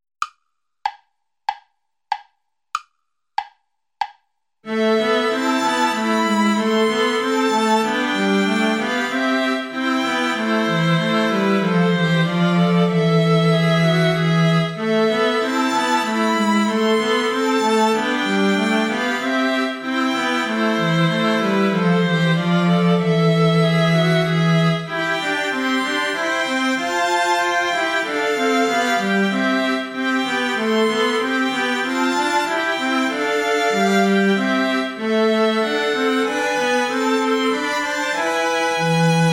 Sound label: play along without violin; bach johann sebastian; ich steh deiner krippen hier